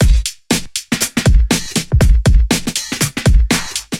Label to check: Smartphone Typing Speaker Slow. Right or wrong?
Wrong